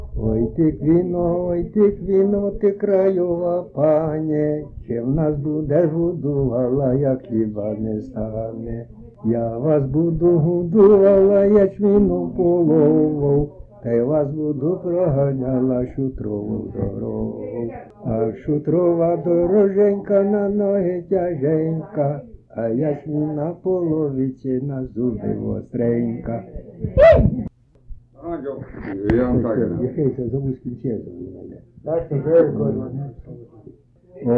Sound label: Ukrainian Songs